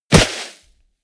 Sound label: body fall snow powder